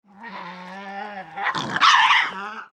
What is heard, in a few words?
tasmanian devil scream; sound ideas